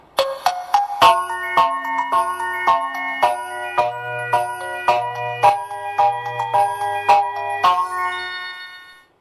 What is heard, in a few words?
melody